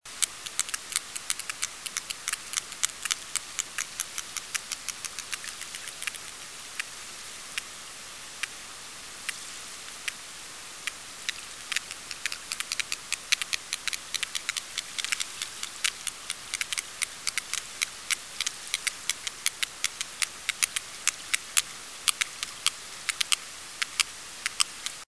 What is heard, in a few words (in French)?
Cachalot chasse